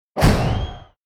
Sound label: pyro explosion